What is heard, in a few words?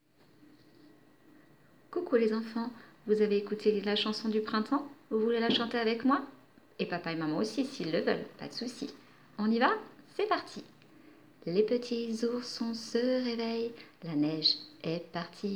Vocal